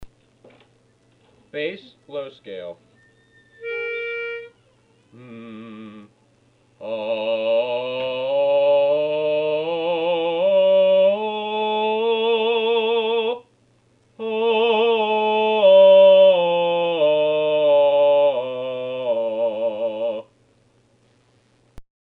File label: bass low scale